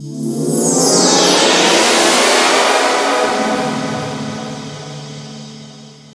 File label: CHORD, 160 BPM, Drum & Bass